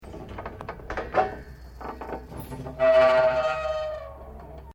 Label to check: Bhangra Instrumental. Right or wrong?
Wrong